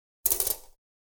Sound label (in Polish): UDERZENIE LUSTERKA O TACKE